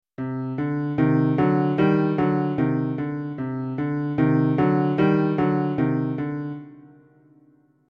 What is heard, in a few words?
piano